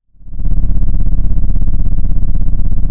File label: Sound of an engine revving